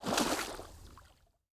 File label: Personnel Footsteps Water; DCS